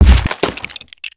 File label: Present Smash; destructibles